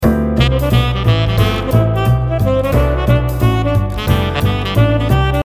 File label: ust jazz